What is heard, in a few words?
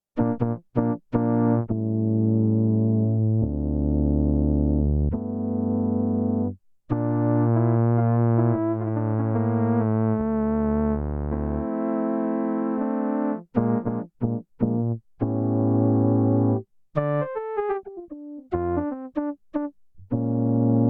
BRASS, SIEL DK 80; Factory